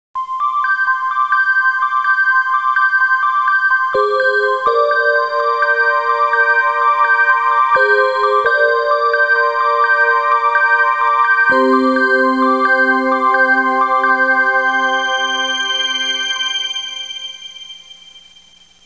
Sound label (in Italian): musica newage